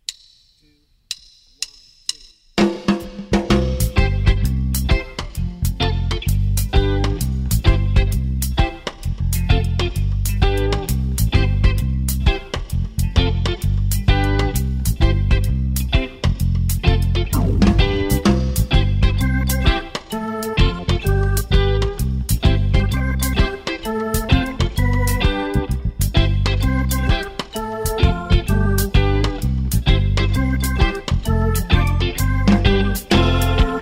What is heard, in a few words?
with drums